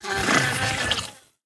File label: Dung beetle sound